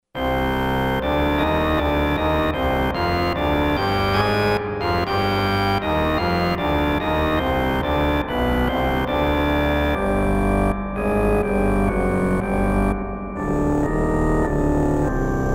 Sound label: Pedal Bombard Pozoun 32, organ